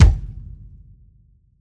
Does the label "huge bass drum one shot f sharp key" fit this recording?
Yes